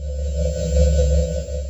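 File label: Ambience Sci-fi Energy Loop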